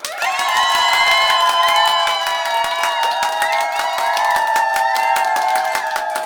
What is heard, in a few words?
SMALL CHEER; 1000fx